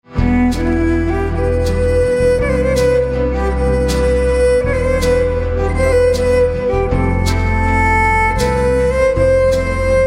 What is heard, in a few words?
Violin